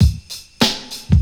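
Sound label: witch bells, Boombap Drum loops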